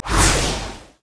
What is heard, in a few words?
shock blast; LoL; jayce